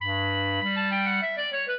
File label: clarinet; Mozart